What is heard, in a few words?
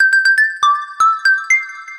High Bells Short Loop; quad Track